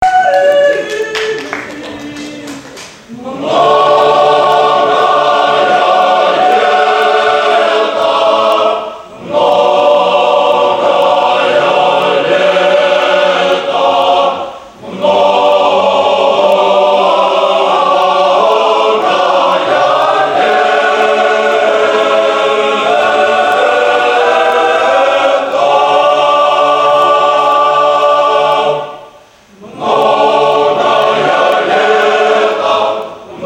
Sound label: male choir mnogayaleto